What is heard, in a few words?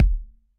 KICK Drum Case